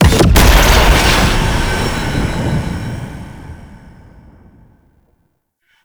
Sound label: Parasitic Capsule Activate; Warframe; Entrati; Conquest